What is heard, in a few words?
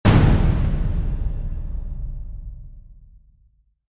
Trailer Hit